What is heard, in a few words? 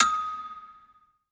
Circle Railings Ride, Drum Hits; Aberdeen Music Hall; Music Hall Percussion